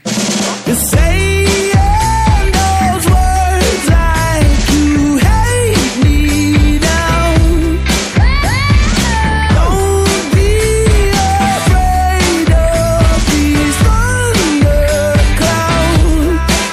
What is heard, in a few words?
Best Samsung Galaxy Note 10